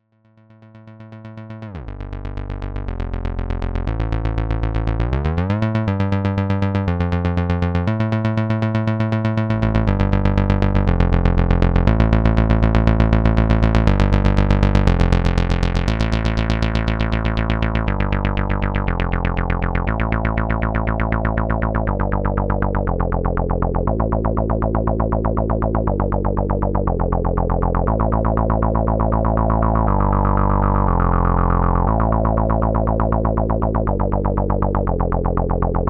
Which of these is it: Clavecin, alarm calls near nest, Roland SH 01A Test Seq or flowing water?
Roland SH 01A Test Seq